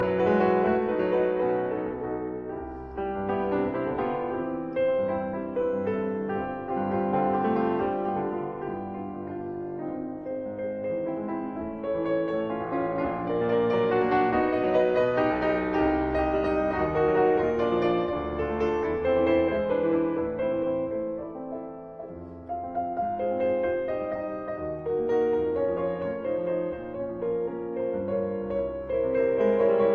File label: Etude in D flat major; 3 Nouvelles etudes Op posth; Juana Zayas